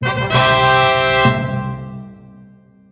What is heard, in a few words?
Trumpets; Angry Birds Racing; Android